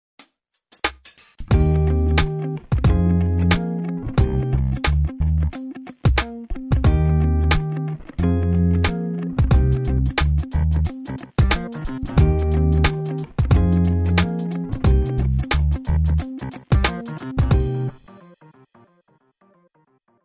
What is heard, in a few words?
Acoustic R&B